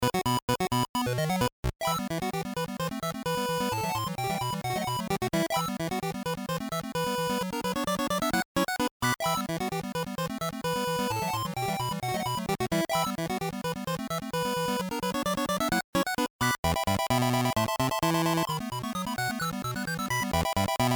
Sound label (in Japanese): 8bit, 運動会で有名なクラシック曲の8bit版です。疾走感があります。; Csikos Post